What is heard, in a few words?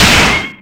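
Battle Gun Critical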